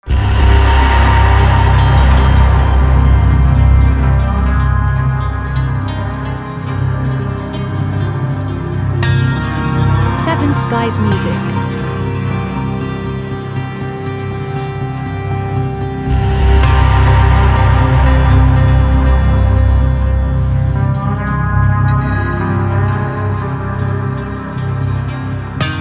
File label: Ambient Dark Film Series